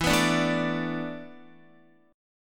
E 7th Suspended 4th, Guitar; E7sus4; strummed

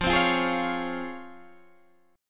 C sharp Major 7th, F, guitar chord

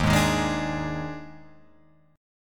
Eb Major 7th Suspended 4th Eb, Guitar, Open F; strummed; 3,3,3,3,5,3; EbM7sus4

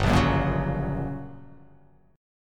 G Minor Major 7th Flat 5th, G, down

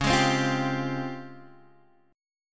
C sharp Major Sharp 11th, Guitar Open G

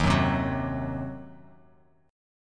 Eb Minor 6th, chord; Ebm6 strummed; JGuitar

